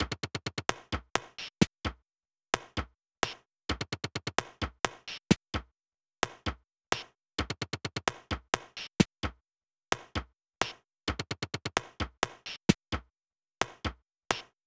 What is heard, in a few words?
drums